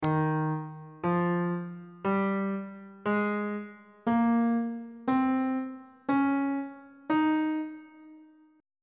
d sharp mixolydian mode on bass clef

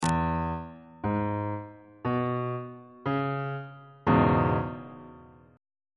f flat major 6th chord

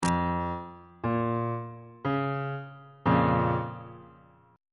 a sharp minor 2nd inversion

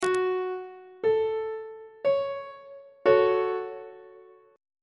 f sharp minor chord on treble clef

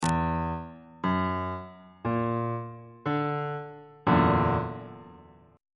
f sharp augmented 7th 3rd inversion on bass clef

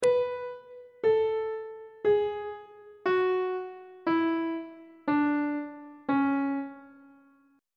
d flat phrygian mode descending on treble clef

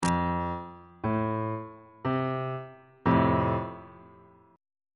e sharp major chord

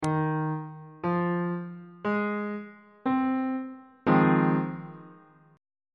g sharp major 6th 2nd inversion on bass clef

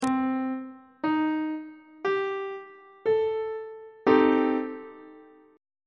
c minor 6th chord on treble clef

